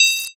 vs card

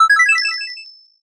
heal

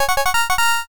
charge trumpet

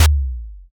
taiko

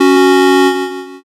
buzzer end

